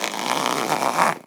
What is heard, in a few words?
Zipper Zip